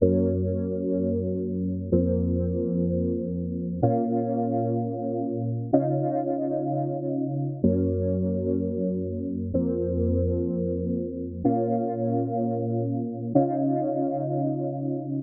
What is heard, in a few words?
Break Chords 126 A m Dry